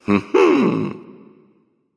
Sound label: heh heh; smite; hades